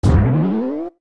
Goron Link Collide; Goron Link hitting something while rolling

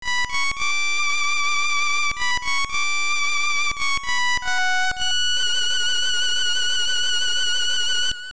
Instrument Cello; The Full Moon Cello; Sirens Instrument

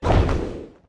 Deku Leaf Out; Taking out the Deku Leaf (for floating) (Recorded)

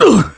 Hurt; Ganondorf Hurt (heard while falling in the air)

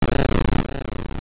Dodongo Roar; Dodongo roaring (also Aquamentus shooting); Zelda Oracle

